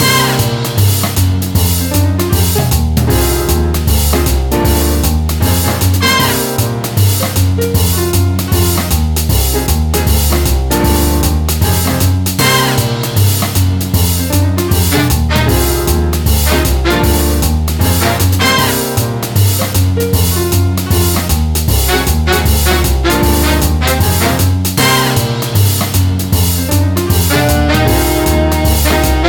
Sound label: Jazz swing that jazz; JukeBox Jazz swing-that-jazz